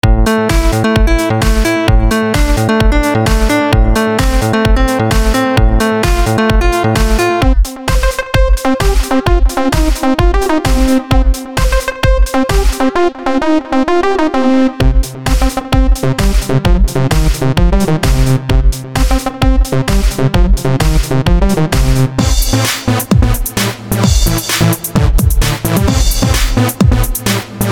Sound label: edm midi loops